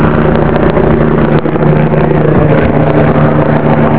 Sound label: Heli